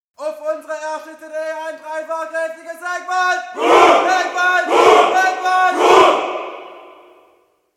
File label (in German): Eckwald echo